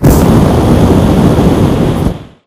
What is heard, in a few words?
amb fire blast; Titanfall 2